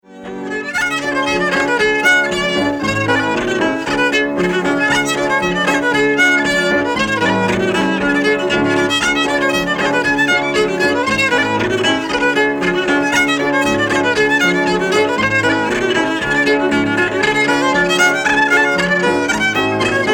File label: Polka; Jacksons Polka